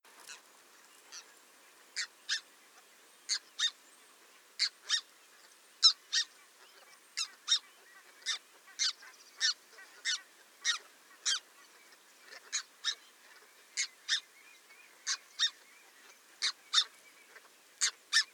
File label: red breasted goose